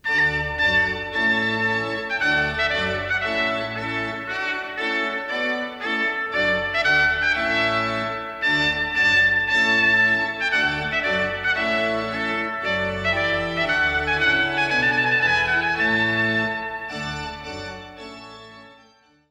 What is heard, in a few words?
Trumpet Tune in D